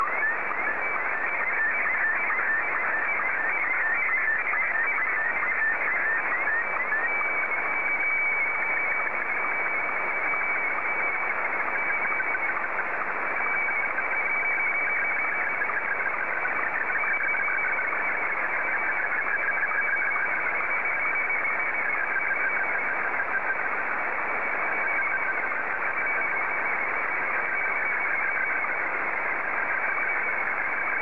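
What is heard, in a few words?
Martin 1, slow-scan television; JA3QJQ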